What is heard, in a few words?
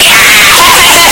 laugh